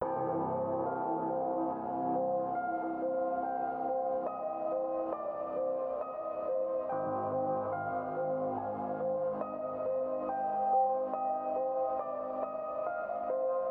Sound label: Melodic Piano